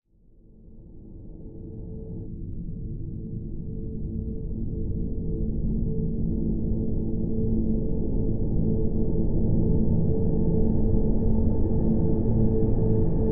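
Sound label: drone A; Drn Delusion A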